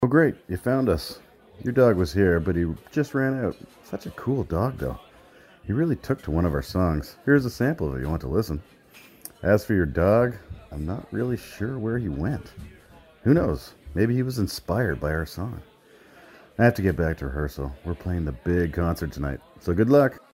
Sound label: big concert